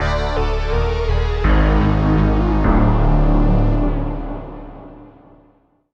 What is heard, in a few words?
Mission Fail; Space Tyrant